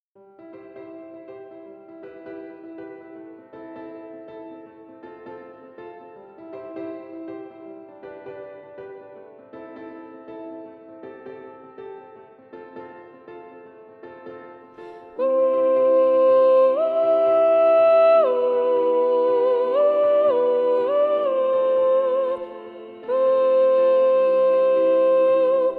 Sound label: SATB Soprano Predominant